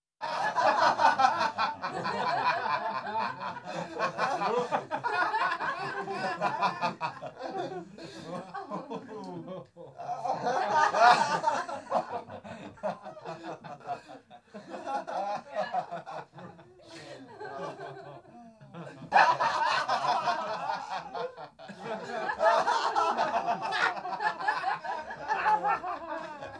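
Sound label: funny bunch of people laughing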